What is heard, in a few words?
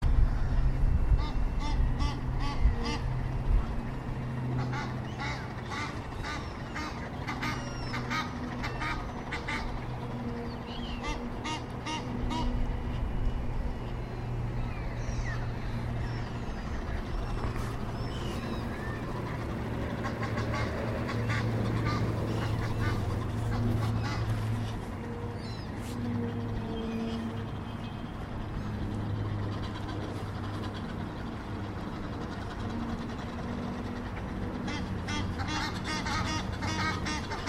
excitable thames birds